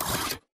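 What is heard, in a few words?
Tick Movement Servo Walk; Titanfall 2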